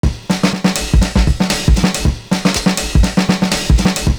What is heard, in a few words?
JUNGLE SNR; Univers Sons